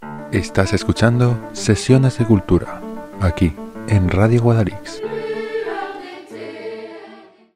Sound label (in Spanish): fondo coro; Sesiones de Cultura